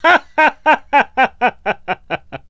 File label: Laugh; Ganondorf laughing